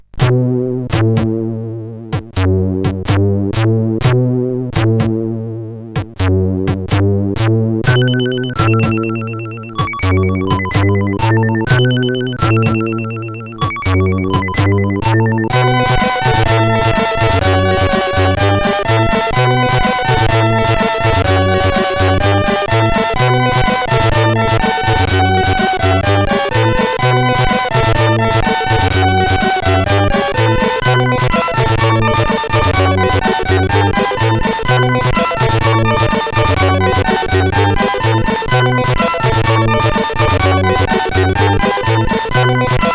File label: tune, sid CSG8580R5; Reaction tune 2